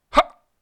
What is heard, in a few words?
Hop